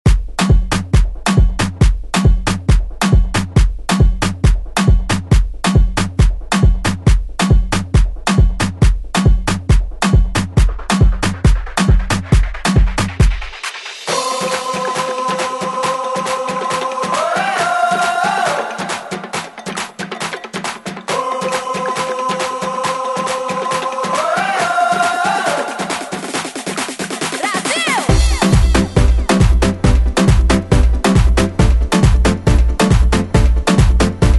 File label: 90s re drum; DJ Mhark